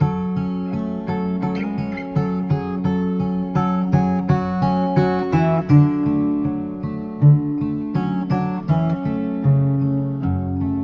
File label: guitar, Loops